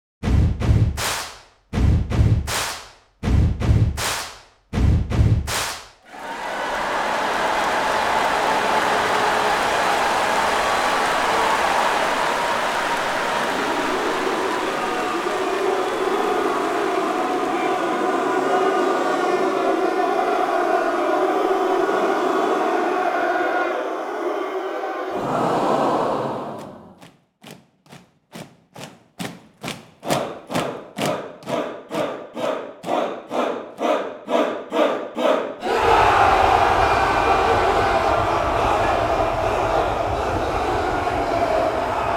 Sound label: crowds; sfx library